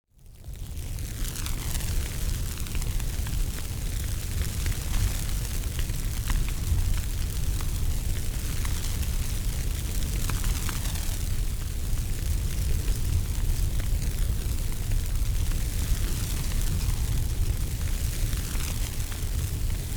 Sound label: Fire Burning Flames Sizzle; Vadi Sound; Seeds and Sticks